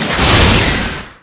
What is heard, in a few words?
Rocket Launcher Shot; Weapons